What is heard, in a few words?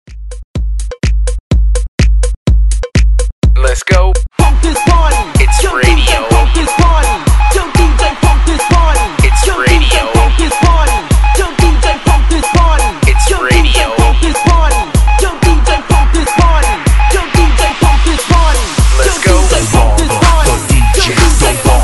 Hype Re Drum 125Bpm